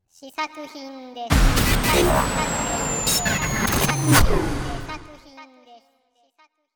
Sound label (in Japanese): 効果音